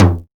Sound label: acoustic tom sample f sharp key, tom drum one shot tuned to the F# note; tom drum samples; one shots